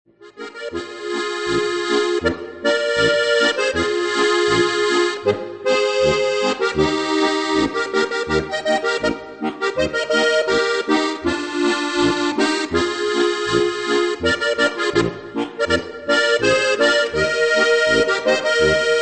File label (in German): typisch goiserisch